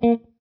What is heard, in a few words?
a note; game states; dead wastes